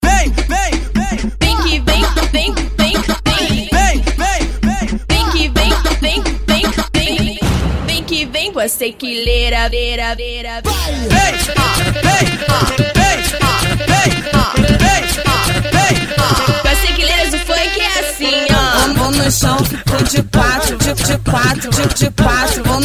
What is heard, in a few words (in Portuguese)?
funk